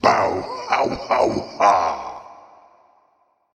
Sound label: wohoho, Demons; Blud Mage; StudioFreresChasseurs